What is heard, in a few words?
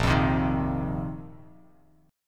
A sharp Suspended 2nd Suspended 4th A sharp, Bass Standard; strummed; A#sus2sus4; JGuitar